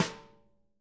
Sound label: BRUSHES, Living Drums